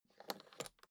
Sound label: suitcase open latch lock